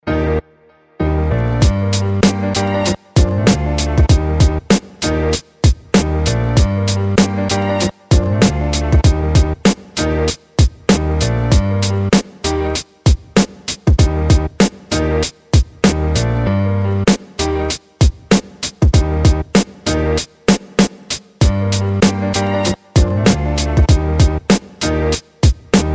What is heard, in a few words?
instrumental, hip hop